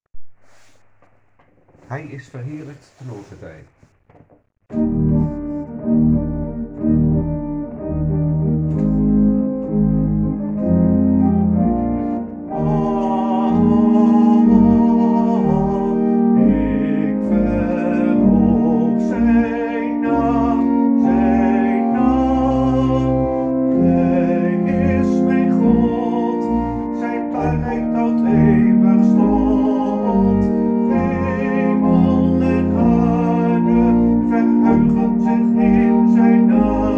tenor

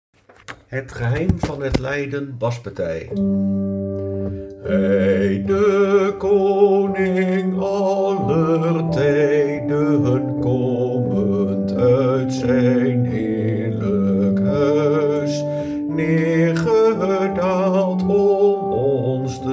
Het geheim van het lijden bas

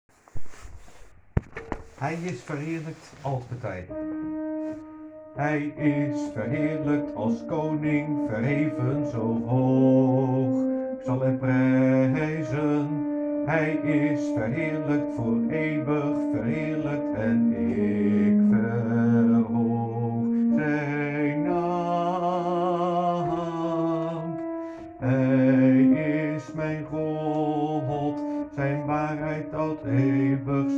alt

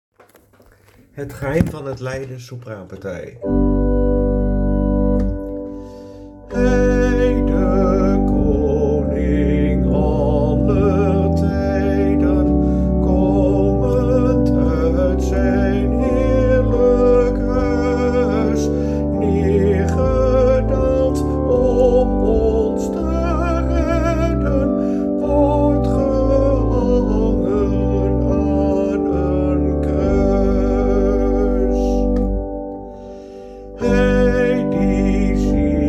Het geheim van het lijden sopraan

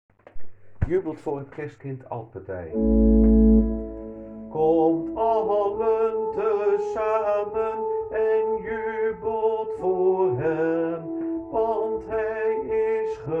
Jubelt voor het Kerstkind alt

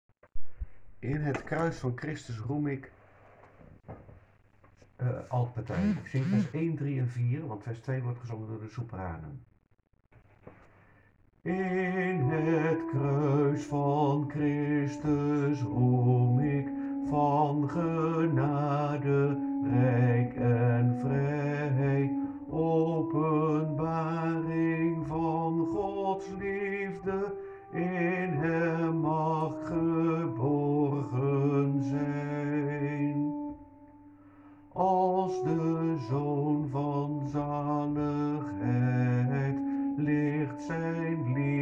In het kruis van Chrustus roem ik alt

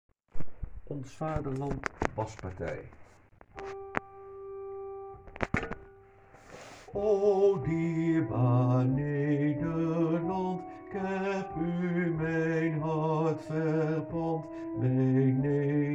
Ons vaderland bas

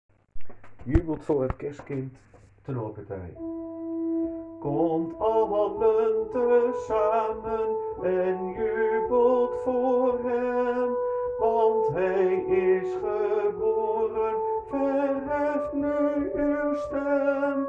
Jubelt voor het Kerstkind tenor

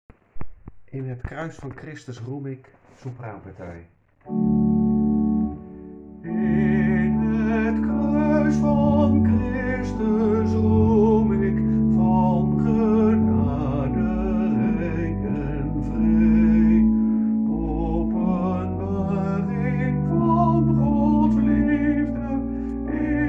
In het kruis van Christus roem ik sopraan

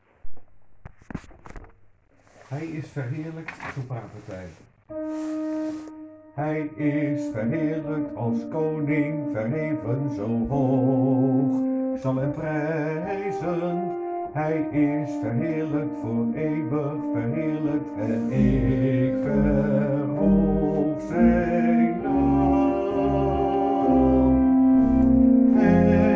sopraan

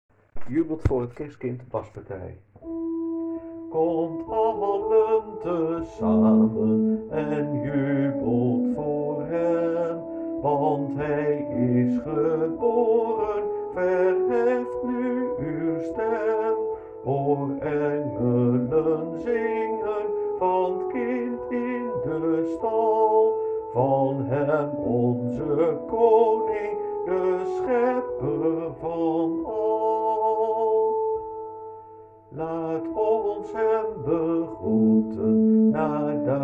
Jubelt voor het Kerstkind bas

